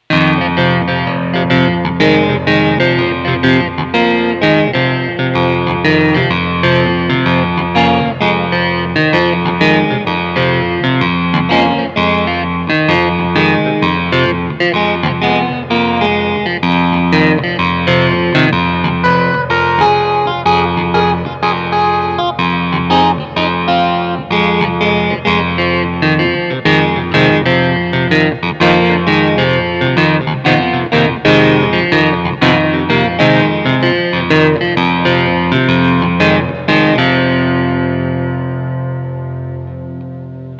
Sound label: Hohner Clavinet D6